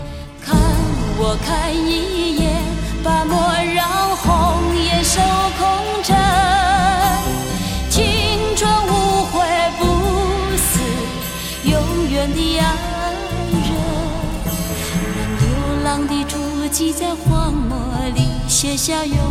Chinese Song

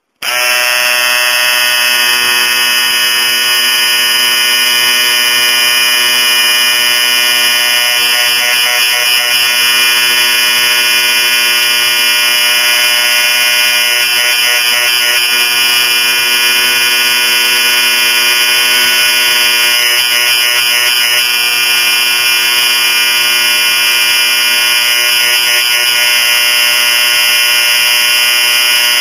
Electric Razor

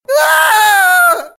Aaarrrghh

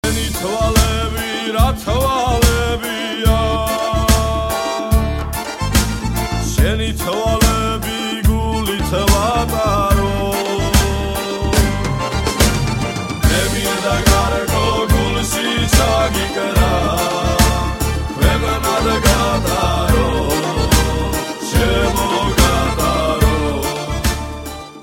Georgian

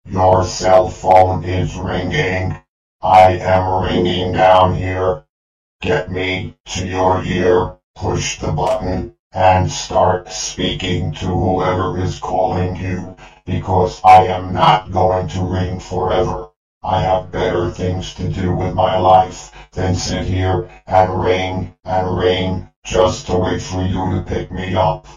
Martian Ringtone

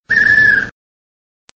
Owl

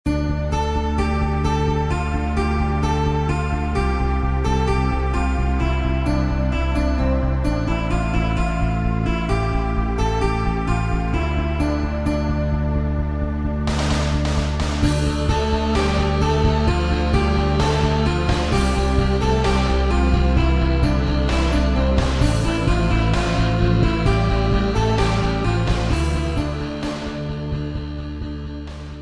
Guitars

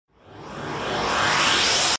Zzzzzzz Tone

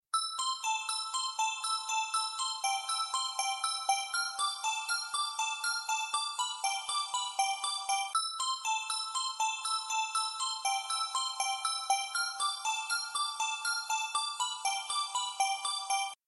Clock; Weekend

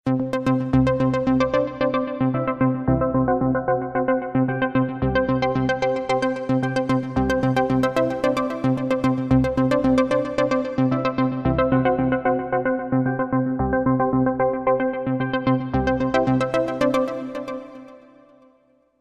Connection Tone

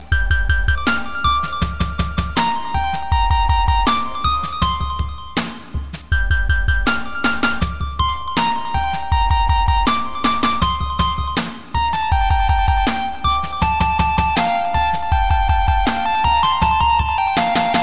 Chimes; Airtel

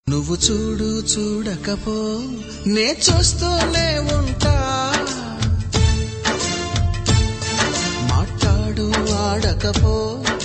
Telugu Love Song

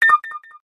Alert

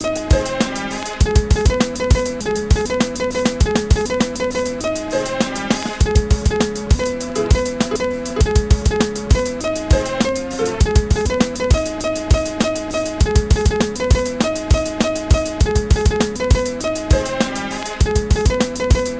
Pluck

